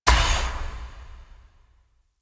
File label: dash end; Enemies; Warframe; Infested; ZealoidPrelate